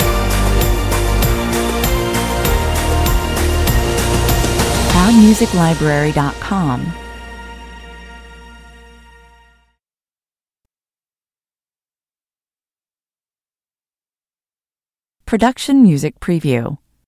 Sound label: stinger